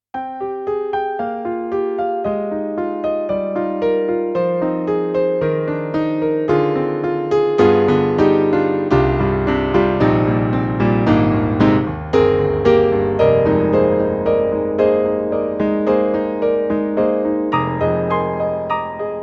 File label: Roland SK-88Pro GM Piano